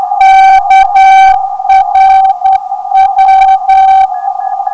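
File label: non-directional beacon; KRW; 351kHz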